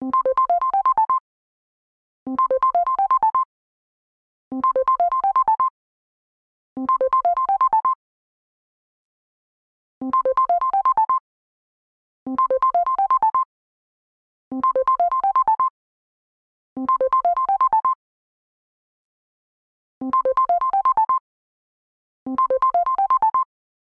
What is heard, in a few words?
Simple Bell, ringtones